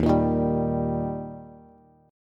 Eb Major Eb down Bass; strummed; JGuitar

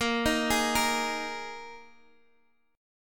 A sharp 7th Suspended 4th, Guitar, Standard; arpeggiated; 18,18,18,15,x,x; capo=2

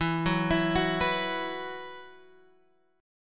E Suspended 2nd E, down, Guitar, Open G

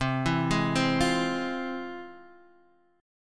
F Minor Major 7th, chord

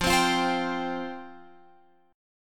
F Suspended 2nd F Guitar Open F; Fsus2; strummed; x,12,12,12,15,14

